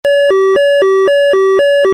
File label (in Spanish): alarma selectiva